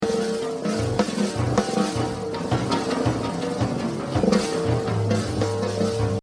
Exercise, jazz drumming

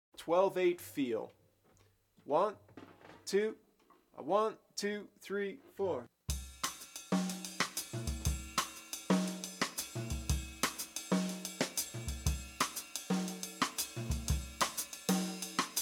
12-8 Feel, Bop Drumming; The Art Of Bop Drumming; Riley

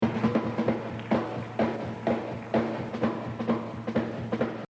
Jazz Drumming

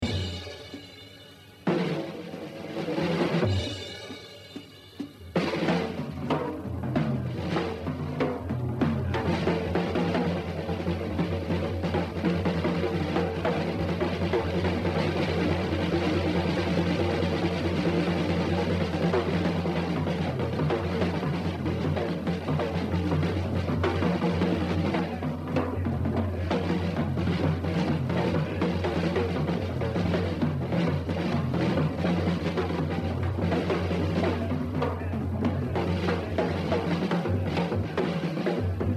Etude, Hard Bo, jazz drumming; Art Blakey; The Evolution of Jazz Drumming (Gottlieb)

